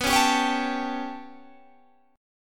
B 7th Suspended 2nd Sharp 5th down Guitar Standard, chord; B7sus2#5 strummed; JGuitar